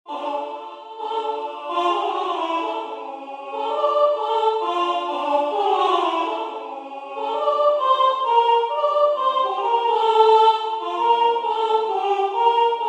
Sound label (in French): Canon des scats voix